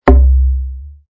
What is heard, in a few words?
fail; klondike